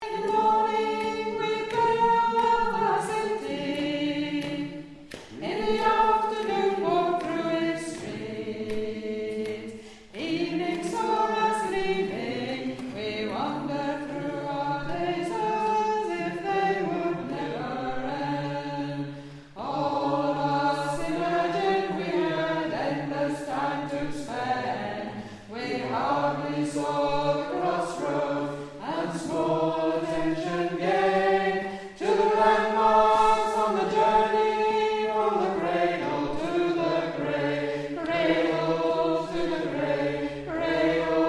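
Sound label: Soprano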